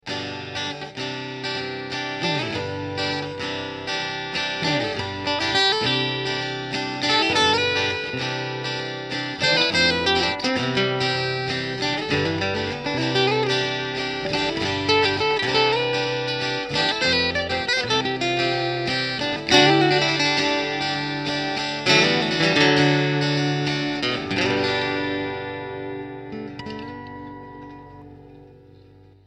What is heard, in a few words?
G C Fadd9 C motiv; Nashville NW-20CSEQ